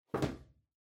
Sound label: Cardboard Box (Far Put Down Empty); 梱包材; ダンボール; 遠い 置く 空